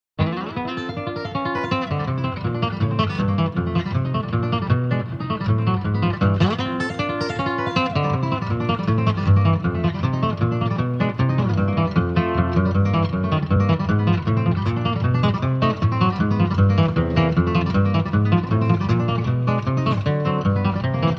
(Instrumental), country guitars